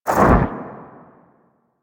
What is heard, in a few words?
Operator Wraith Void Exit; Warframe; Focus; Powers; Wraith Movement